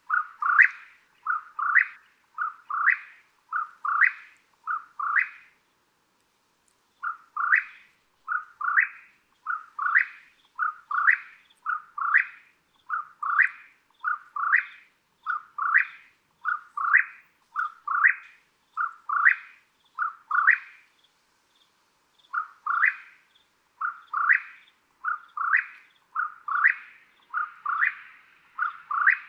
Mexican Whip-poor-will; the song of the closely related Mexican whip-poor-will. May 6, 2016. Portal, Arizona.; Eastern whip-poor-will

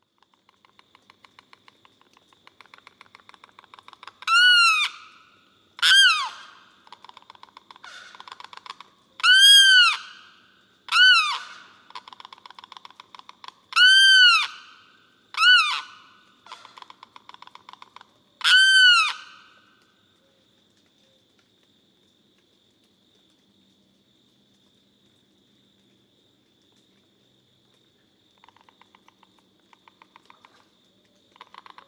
Limpkin

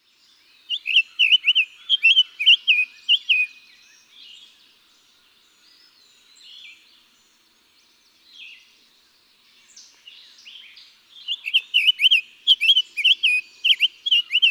Summer Tanager

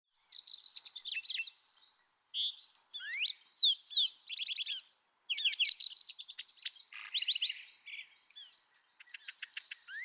Lark Sparrow

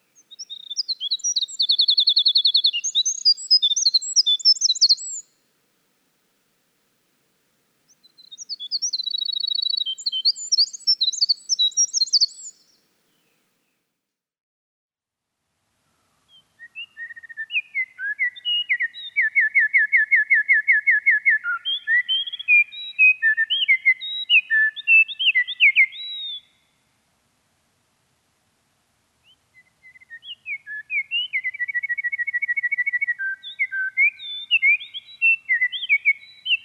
Winter Wren

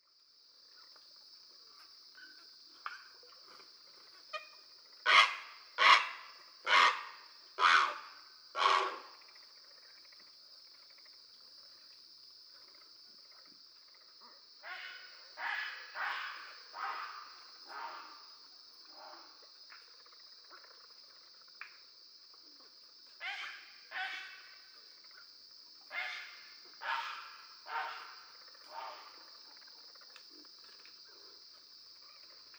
Great Blue Heron

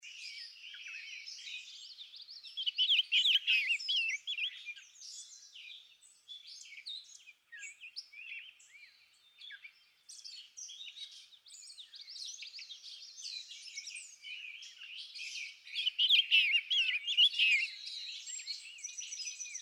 Rose-breasted Grosbeak

